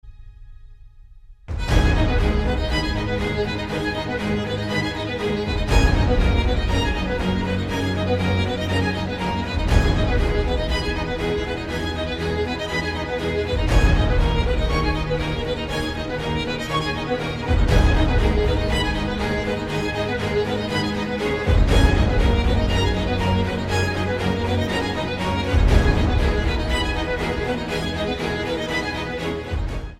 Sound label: motivational violin ringtone